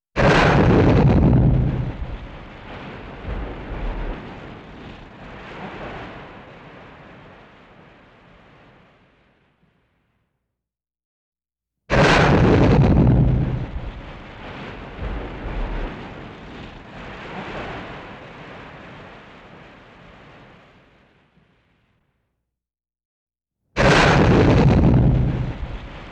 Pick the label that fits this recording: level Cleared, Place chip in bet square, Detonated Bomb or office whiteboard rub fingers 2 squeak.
Detonated Bomb